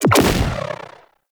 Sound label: Space Fighter Dredger Jelly Rocket Fire; Grineer